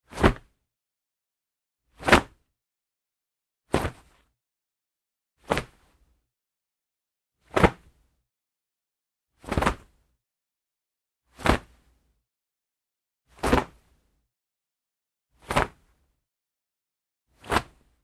Foley Fight Moves Pillow Case Singles Hard, wrestling and struggling; Big Room Sound